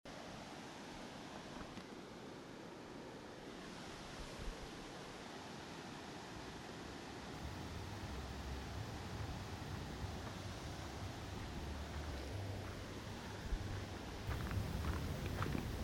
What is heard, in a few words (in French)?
ambiance festival; cabaret vert 2016